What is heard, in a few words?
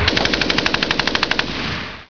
MAXIM, GUN SNDS